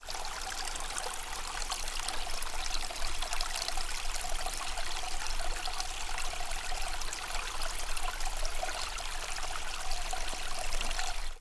Ruisseau De Ponchale; Taurion; Chatelus-le-Marcheix

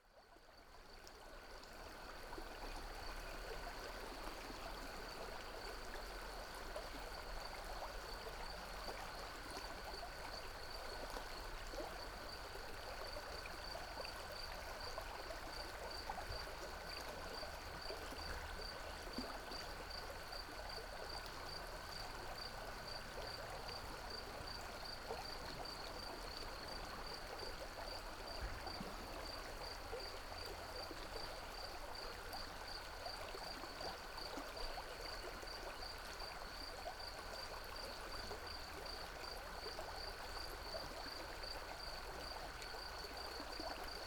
St Hilaire le Chateau ambiance soir; Cedric Peyronnet

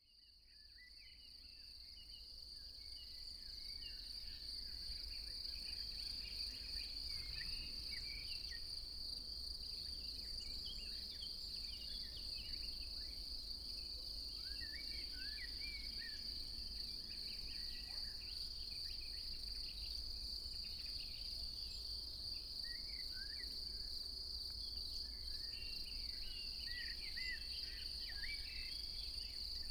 Vidaillat Chaleix ambiance soir; Cedric Peyronnet

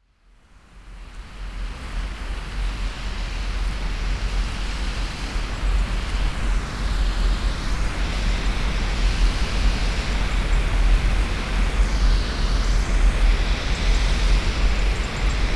Centrale hydroelectrique; Chauvant

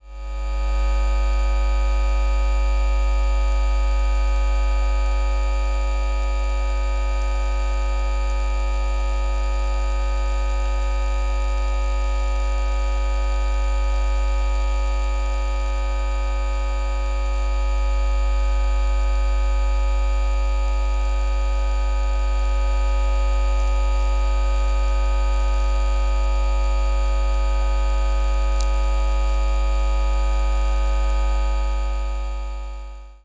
Station electrique; Maureix-St-Martin-Terressus; France

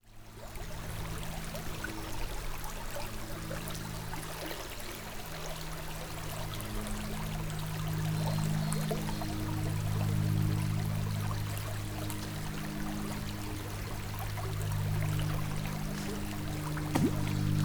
Moulin de Quinsat; Masbaraud-Merignat

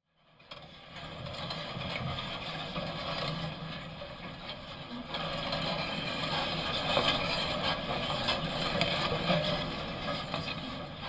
Clôture vers St Martin

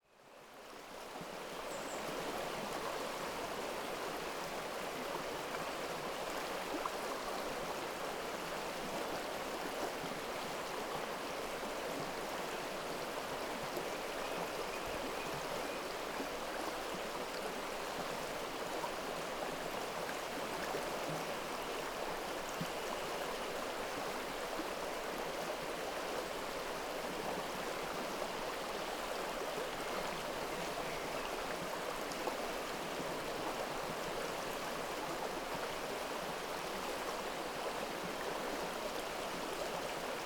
Gorges du Thaurion remous; Thauron; Cedric Peyronnet

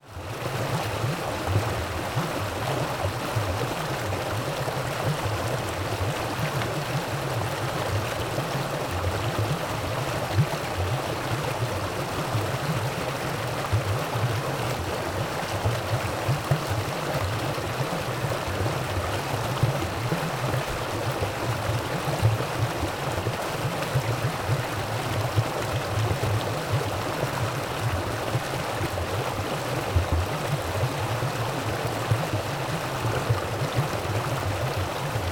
Ruisseau; Roche-Talamie; Tourtoueroux

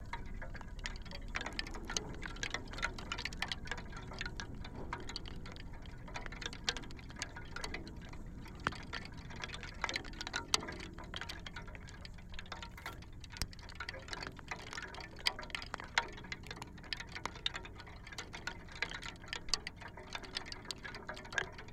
Cloture pluie vent; Cedric Peyronnet

